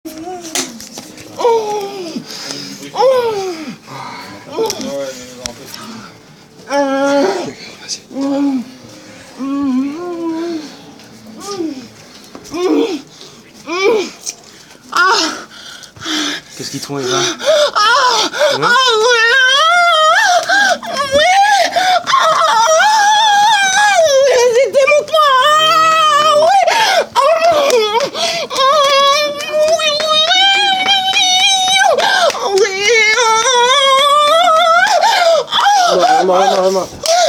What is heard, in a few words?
Vocal